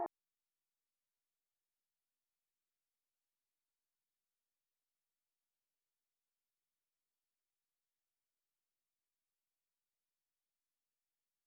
C# Maj 125 BPM Vocal; Melody Loops; Loop Stems; Cymatics; Rainbow Road

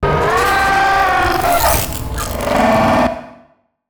wooshes bent metal; Whooshes

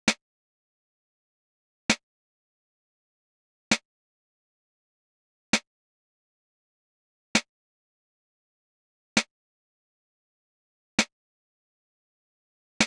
Drum Loop, 132 BPM Percussion, Garage; Loop Stems; Cymatics; GHOST (Beta Pack); Centre Drum Loop

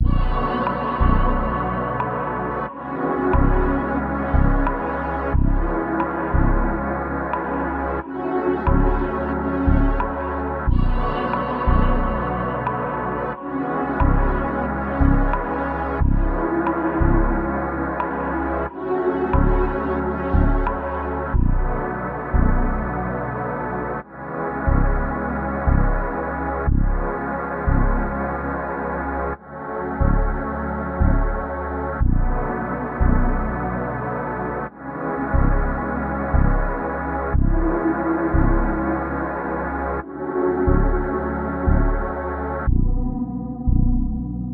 90 BPM F# Min, Melodies; Cymatics; 2022 Melody Mystery Expansion

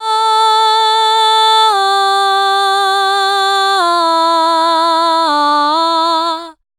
Vocal Lines - Fm 60Bpm; One-Shots; Ghosthack; SkyRealm

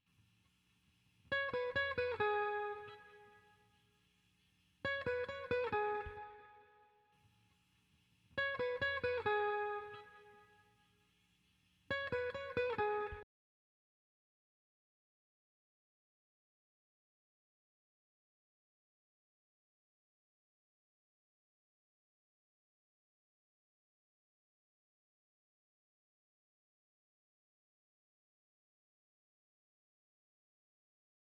136 BPM E Maj Guitar Layer; Loop Stems; Cymatics; Utopia; Lovers

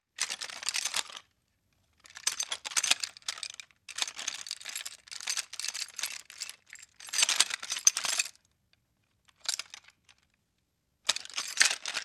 Gun Metal Rattle Shake; Fairlight Sound Lib

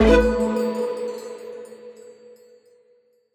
Menu FX Rollup - A6(Sus2); Video Game; Cymatics; Video Game Sample Pack Vol 1

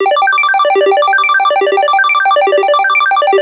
Arp G 140 Fast Arp, Melodic Loops, Color Bass; Ghosthack - Color Bass Essentials

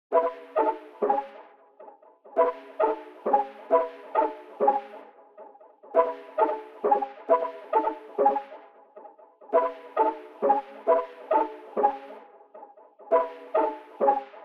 134 BPM C# Min Pluck, loop stems; Melody; Cymatics; 2022 Melody Collection - Wavy; Skyline

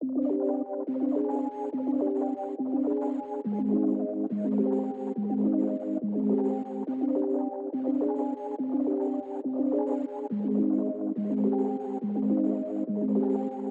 140 BPM C Min Keys; Melodies; Loop Stems; Cymatics; 2022 Melody Mystery Expansion; No Loyalty

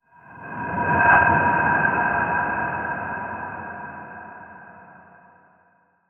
Dark Chime; Ghosthack; SkyRealm

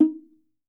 Log Drum - D#; percussion; Cymatics - Infinity Beta Pack 2.0; Zeus Percussion Collection

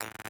Grid Fragment A 145 BPM, Psytrance; Grid Loops; Narval

